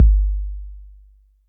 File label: BD5050, TR808; L'Effet Pommier - Volume 04